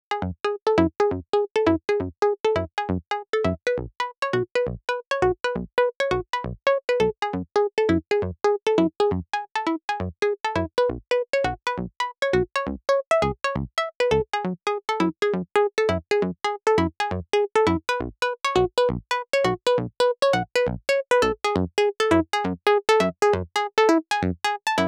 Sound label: 135BPM Sequence Intro DRY, F#minor; Unlooped